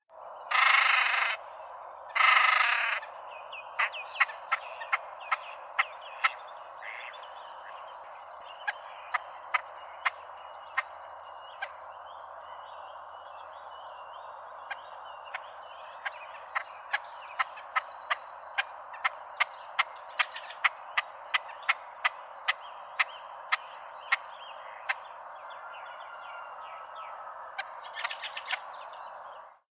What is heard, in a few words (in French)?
grebe huppe podiceps cristatus